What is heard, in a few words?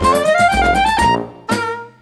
mus cage forcefield; Rayman Origins; jungle world